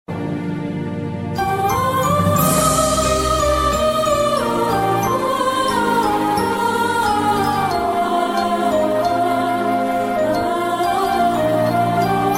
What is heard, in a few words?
heart touching tone ringtone